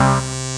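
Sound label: synth C, BUZZ SYNER, House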